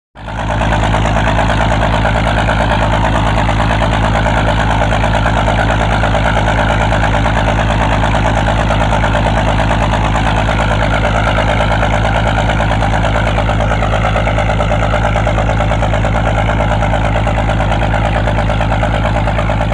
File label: Boat with Engine; Run ( Ext. ); Constant Large Boat Engine.; powerboat